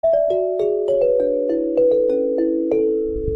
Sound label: nokia aquarion